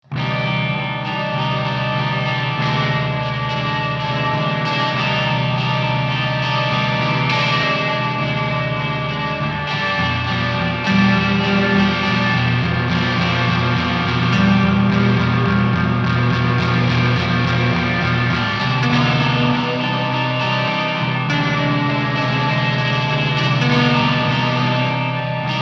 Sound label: Lots of reverb; moon palace; Hylics